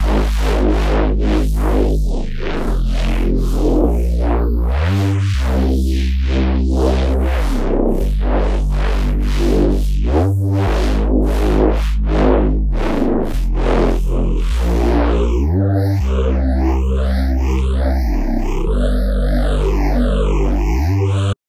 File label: reese; wavefield